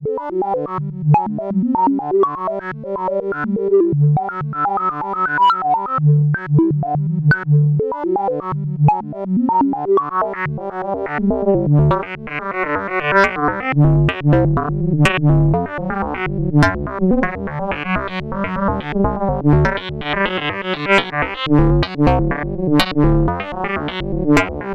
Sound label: Linnaeus Linear FM with same OSC as Input Tracking On; Rossum Electro Music